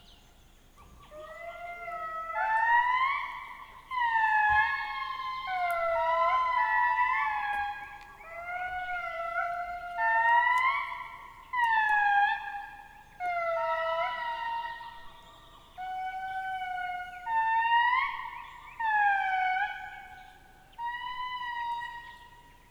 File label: Indri in Madagascar